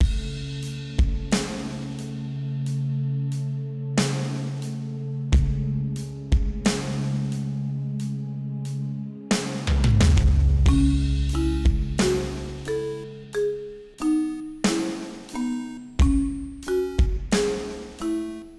Ruin Loop

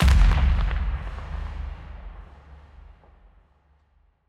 time travel time hole boom

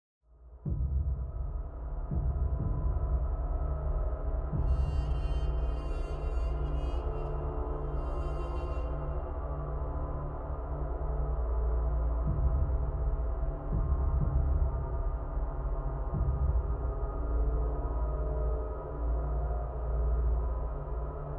String

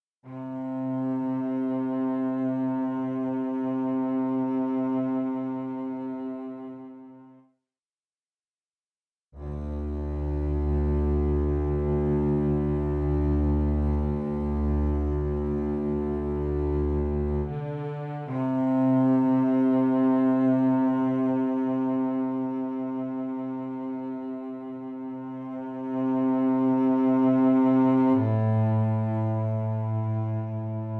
Viola

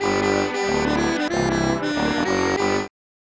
Jingle; Unknown Jingle 2; Tom vs Jerry: The Chase Is On; SNES

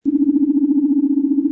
UFO; Ballance

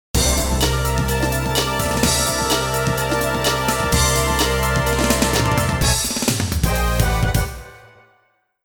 Fanfare; Sega Rally Championship

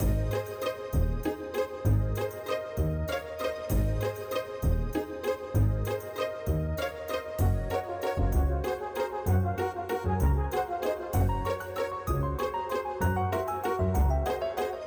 Music Waltz

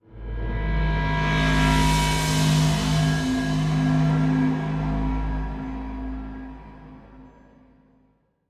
Music Shorts, Before action

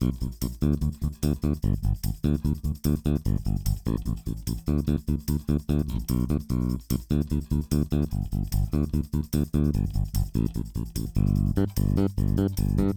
Bass + Tamb

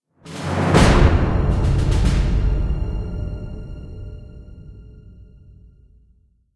Objective Complete; Battleship; xbox 360

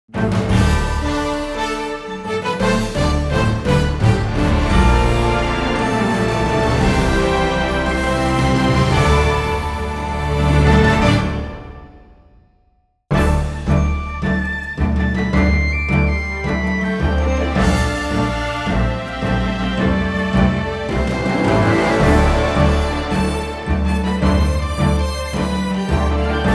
Smashing Victory; Wargroove 2

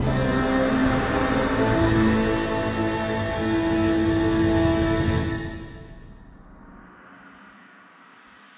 Stealth Detected Short; Abudin; tintin reporter cigars of the pharaoh

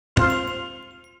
victory medal bronze; Replay VHS is not dead

